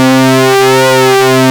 NEW R SYNC, ARP Synths; Analog Odyssey; E-MU Formula 4000 Series Vol. 3 – Analog Odyssey

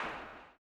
CLAP, blues & soul; ILIO; Vocal Planet

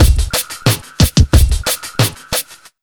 BEAT, Underground Hip Hop

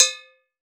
Agogo Bell L; Roland BK-5; Percussion

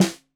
Snare 65 Reg Stereo, Deeply Sampled Drums

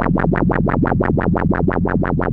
ARP 2600 E2, synthesizer

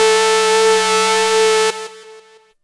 saw lead synth; Ueberschall; Techno Trance Essentials

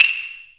hyoushigi, Ethnic Percussion

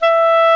Clarinet & Bass Clarinet

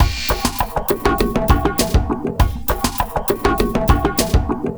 Echo Tabla Beat; Sonic Foundry (Sony Creative Software); Myths of Technology; Composite Beats

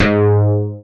BAS FM DX-7

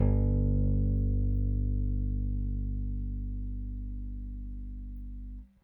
RickThumb-B, RickenbackerBass; Airwindows

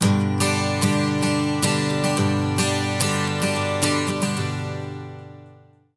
110 MAJ 12 STR, Acoustic Guitar Loops

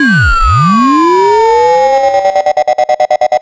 Sweeps Up, Effects

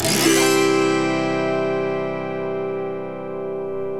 AUTOHARP; OMI - Universe of Sounds

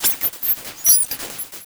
magical seq; Big Beat Samples & More; AKAI; ESO SEQS KIT

